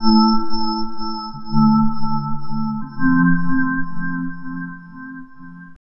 Tracing, Synth Sequences

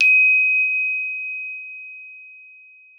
GLO D#5, GLOCKENSP; Xsample VOL-4

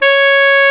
ALTO SAX C#5; Greytsounds VOL-1

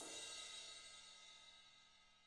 ZILDP CRASH; ILIO; Double Platinum Drums 1

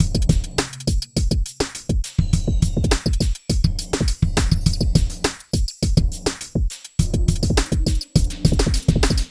Beat

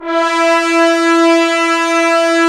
BRS, Roland LA Composer; Tbns

